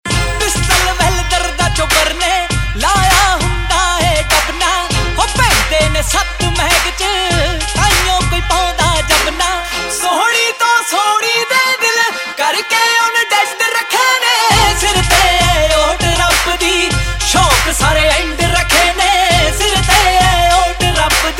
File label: Ringtone, Punjabi